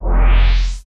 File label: spaceship boost